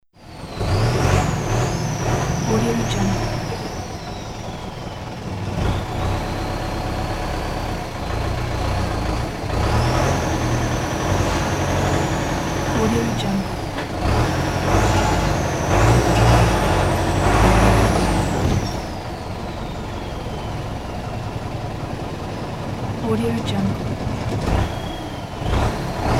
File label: truck turbo diesel parking in the parking lot